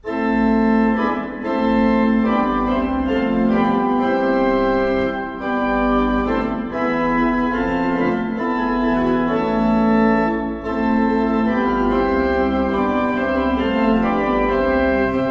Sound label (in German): Euler II P, Orgel